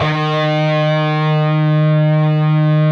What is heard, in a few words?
PRS E, PRS LEAD, Guitars; Spectrasonics; Hans Zimmer Guitars VOL-1